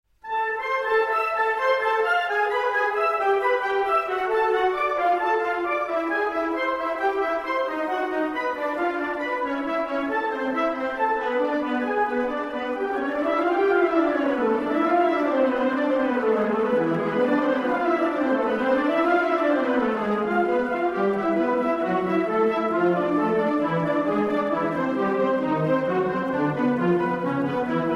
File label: Prelude Fugue